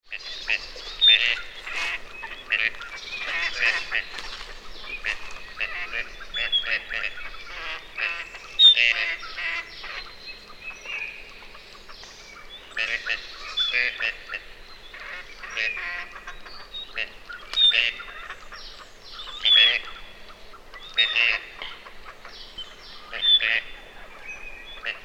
Canard chipeau, Etang; MixPre; Dodotronic; Etang neuf StNicolas les Citeaux

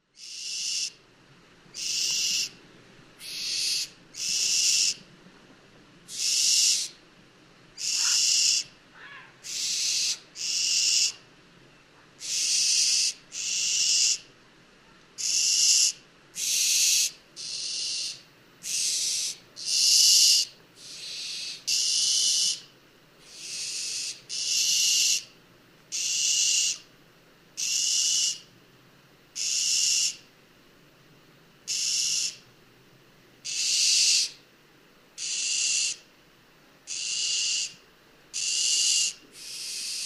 Effraie des clochers

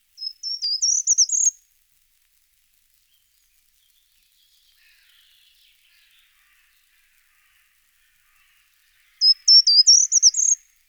Grimpereau des jardins

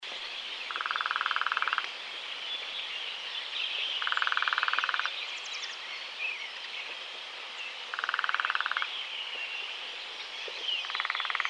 Pic epeichette

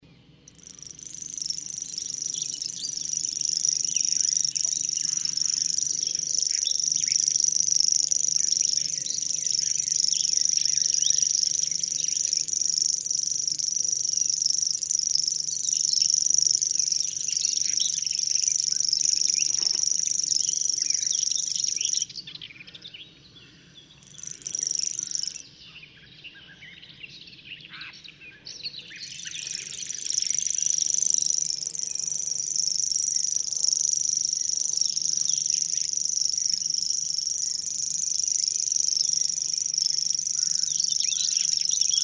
Locustelle tachetee

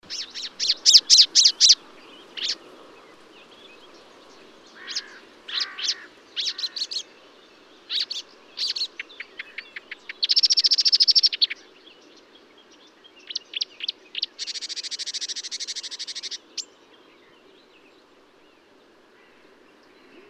Rousserolle verderolle, chants oiseaux; Bonnevaux

